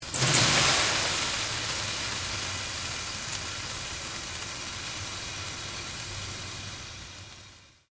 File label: Water Sizzling Very Hot Pan; Heated water sounds; Kitchen; Sound Effects:Household